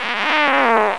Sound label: fart